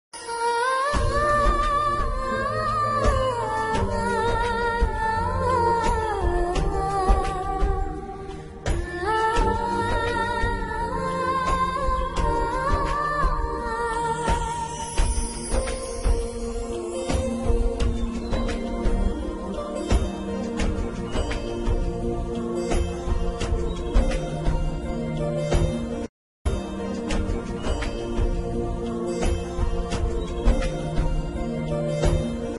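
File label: Heart Touching Sad Female Ringtone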